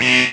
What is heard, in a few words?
BUZZER; tenpin